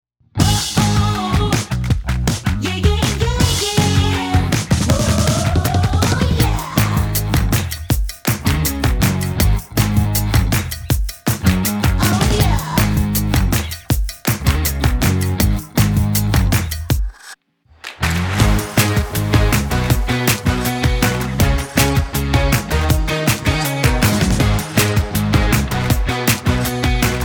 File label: music energetic pop moving party